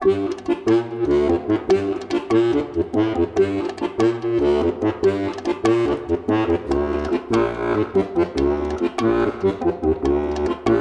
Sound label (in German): Tango, steirische harmonika